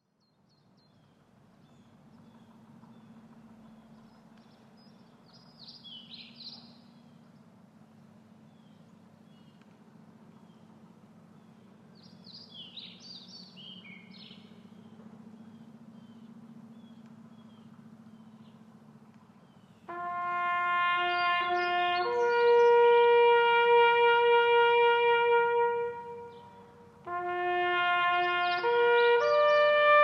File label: Taps - Bugle Call, Drum & Bugle Corps; USMC